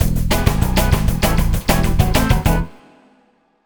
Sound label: Swinging 60s, Lounge; Zero-G Kocktail Kollection; Construction Kitz (Lounge); Swingin 60s Mixes 098